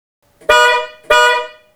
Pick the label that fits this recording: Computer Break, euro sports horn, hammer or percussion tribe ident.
euro sports horn